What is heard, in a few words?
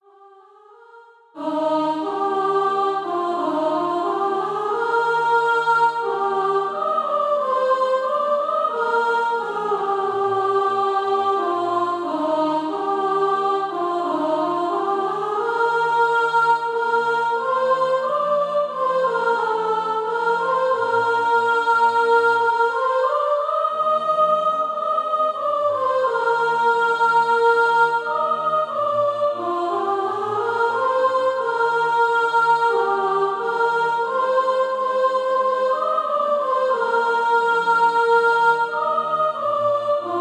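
soprano, SATB Practise Music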